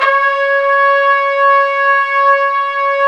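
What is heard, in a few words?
brass, Tpts Swel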